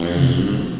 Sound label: Toilet; OverTheBrink